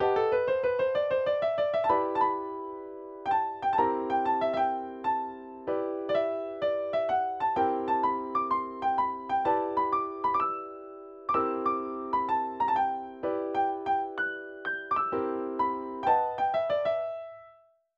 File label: instrumental